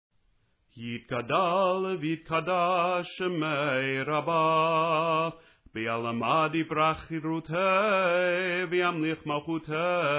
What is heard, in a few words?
Chatzi Kaddish